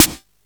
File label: snare; audiomulch; simplekit